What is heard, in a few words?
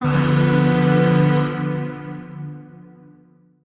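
Shawzin Major Chord; Warframe; Zariman; Void Shawzin; Chords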